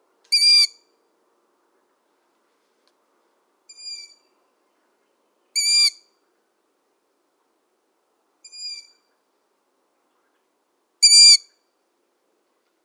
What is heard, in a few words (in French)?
Hibou moyen duc jeunes; Pichanges